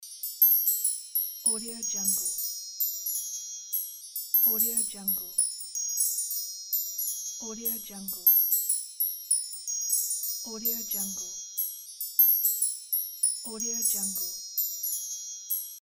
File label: magic wand looped